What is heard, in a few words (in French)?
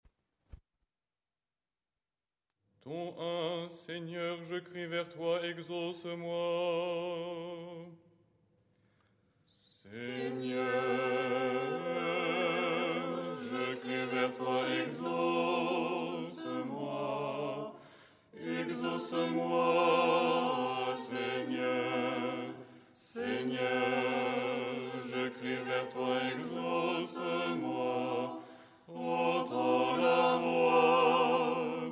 stichere lucernaire ensemble